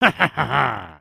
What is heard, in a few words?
stun; creatures organic